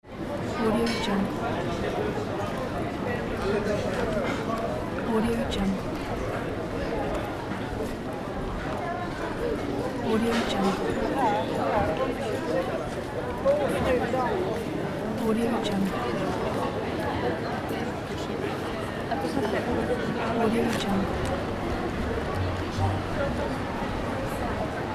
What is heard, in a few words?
people in a street cafe